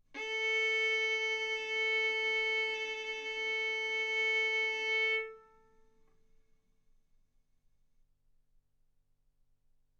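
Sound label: Violin arco mf A string A4 B4 stereo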